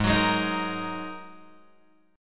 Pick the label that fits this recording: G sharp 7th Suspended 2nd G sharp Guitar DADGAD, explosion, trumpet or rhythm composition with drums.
G sharp 7th Suspended 2nd G sharp Guitar DADGAD